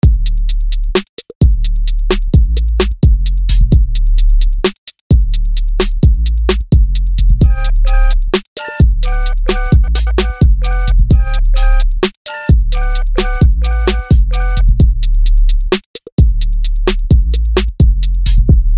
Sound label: batbodrums